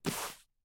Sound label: Cloth Drop; Game rip; MiSide